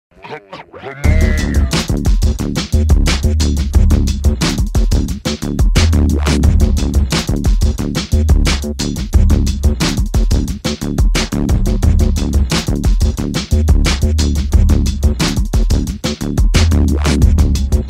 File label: Beat